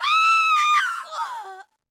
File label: Long Scream